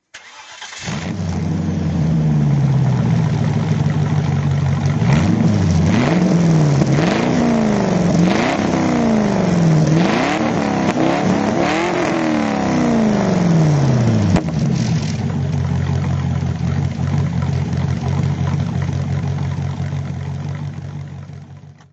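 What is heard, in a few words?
morgan plus 8 throttle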